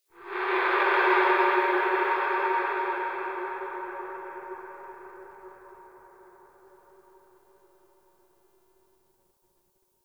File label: Texture Interior Medium; Ambience; Warframe; Sentient Lair